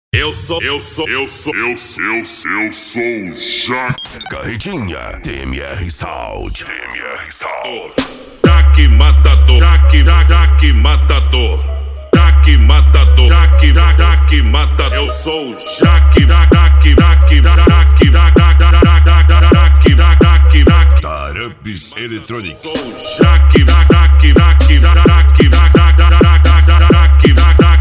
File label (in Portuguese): esp insane sound